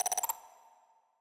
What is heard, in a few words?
promotion; geoscape